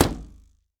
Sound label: Arrow Hit, Longbow; SteamVR; InteractionSystem